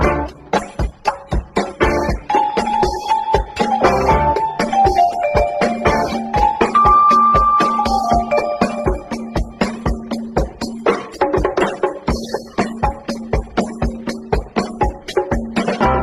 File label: full vibes and guitar, Loops, Phonky